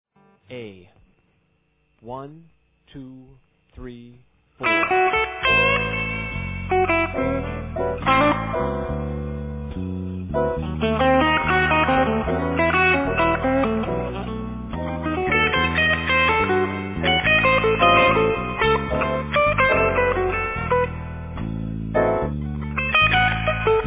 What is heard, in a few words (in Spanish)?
arp dim en penta